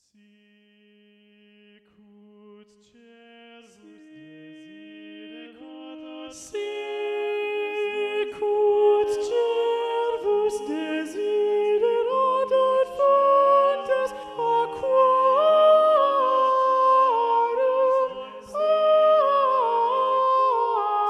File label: soprano predominant; sicut cervus; palestrina